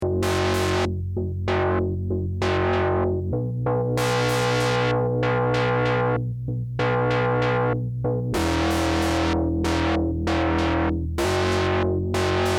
Duophonie

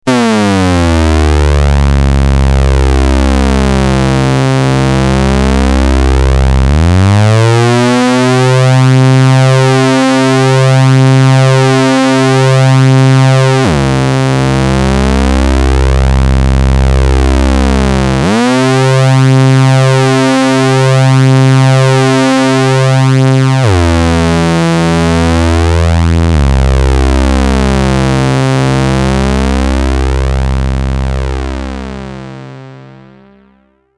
filter auf und glide

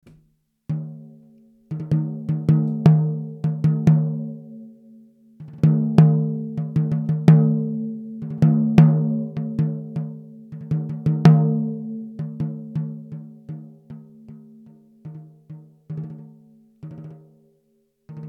Tama LMB1465 tief

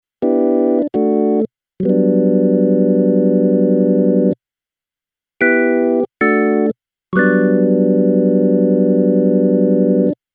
Vox Continental Orgel Percussion Vibrato Chorus

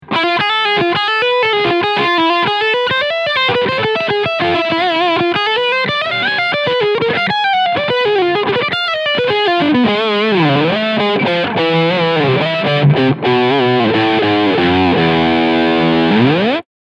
Ibanez RGT1221PB DTF Lead Sound Steg PU

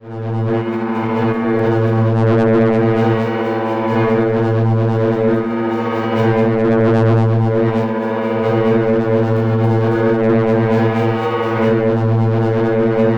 Korg volca modular Drohne

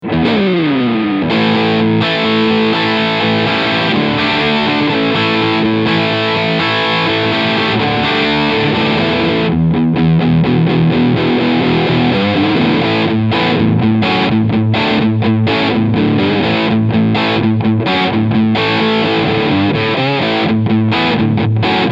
Riff Gebraten

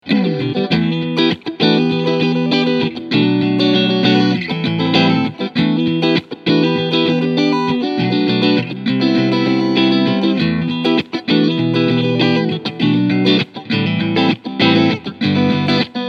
Fender Player Series Strat Cleansound Front Mittel Pickup

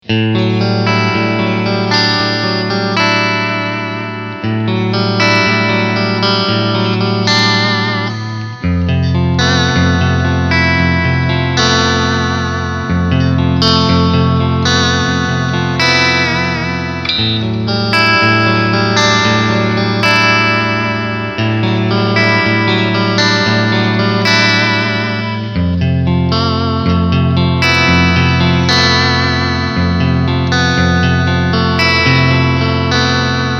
Clean Picking SC und Steg S

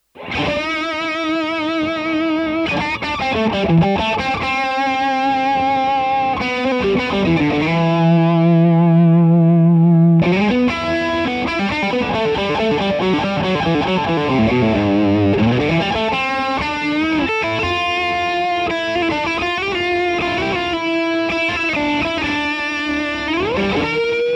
Tele High Gain MD421 Vergleich

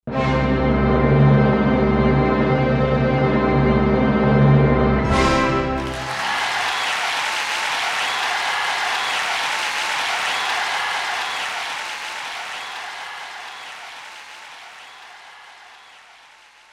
Roland S-330 Orchester

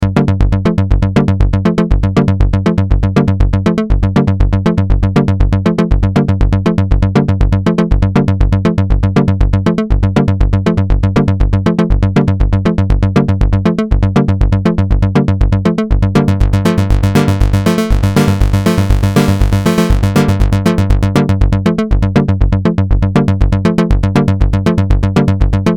SH01a SH Sequencer steuert Juno-106 an

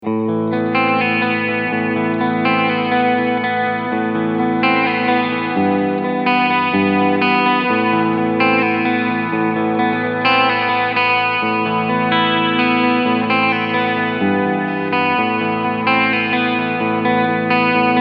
Hall pur

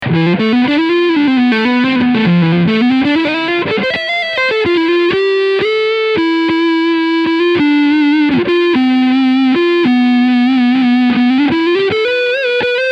Tribute Doheny Surf Green E-Gitarre Leadsound Front PU

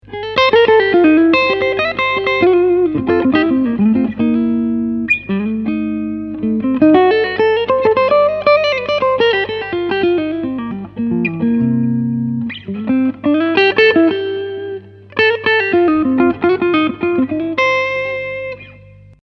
Gain 11h ISF 12h Overdrive off Halspickup

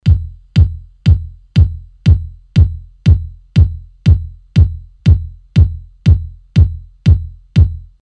Bd Kick off Boost off; Telefunken M82

